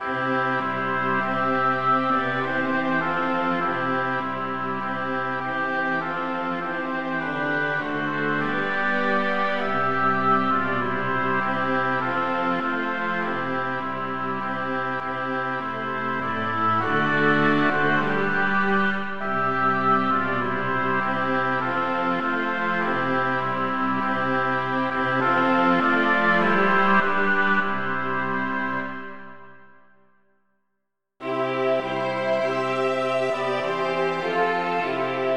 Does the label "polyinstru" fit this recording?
Yes